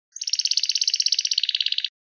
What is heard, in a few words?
Domestic Canary a syllables